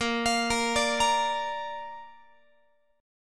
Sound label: A sharp Major, Guitar; arpeggiated; tuning 4A,4E,5A,5D,5G#,6C#